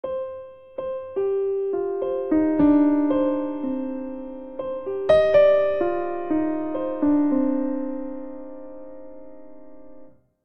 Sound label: Phrase Skandi C Minor9 90bpm, Grand Piano; MIDI Clips; Ableton; Factory Packs